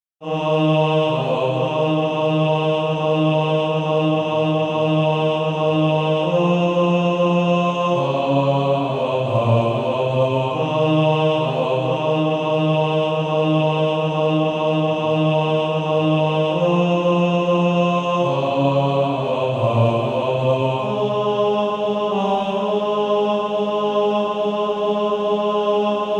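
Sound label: Male Choir, bass 2; On Christ the Solid Rock I Stand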